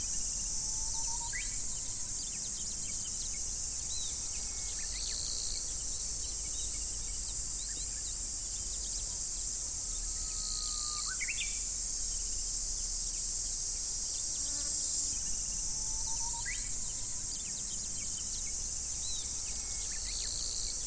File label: glassland; nature